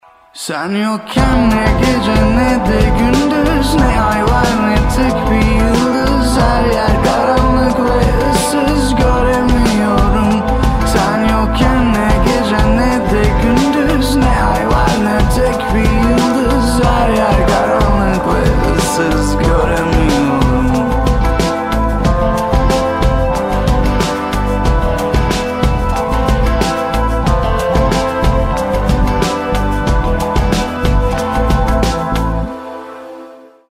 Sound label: rington